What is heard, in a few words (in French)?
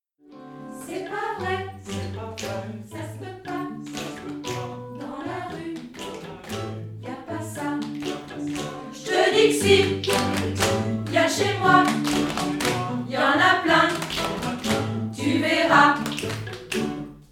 refrain rythme et echo chante